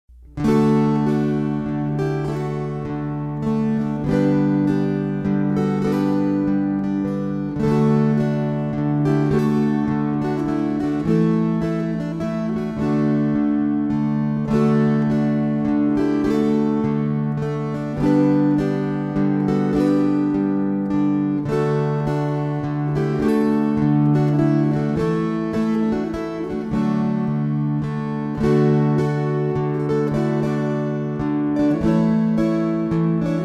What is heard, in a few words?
Waltzes